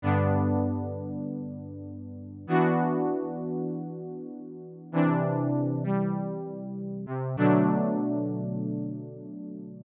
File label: Pad